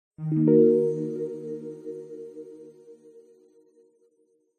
multimedia game sound zen game soft warm relaxing lightly negative tone